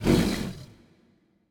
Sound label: fire; smite; hades